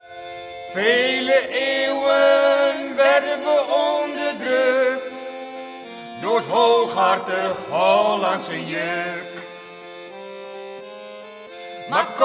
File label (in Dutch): Volkslied Nieuw Groningen